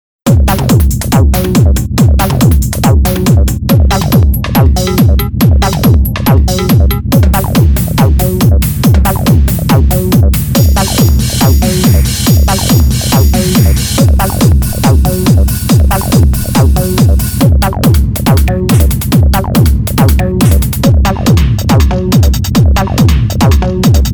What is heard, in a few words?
acid trance loops